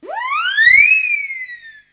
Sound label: siren, Whistle